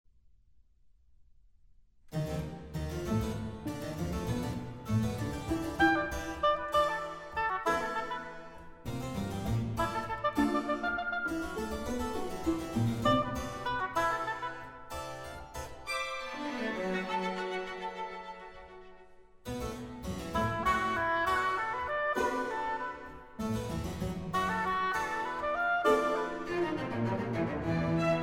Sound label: Concerto for Oboe Harpsichord and Stri; Balakauskas